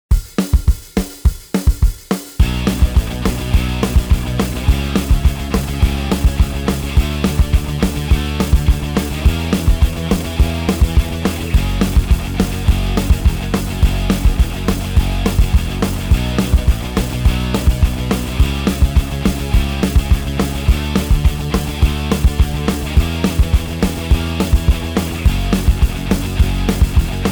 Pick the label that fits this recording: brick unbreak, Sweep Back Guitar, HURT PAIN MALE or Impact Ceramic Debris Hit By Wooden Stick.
Sweep Back Guitar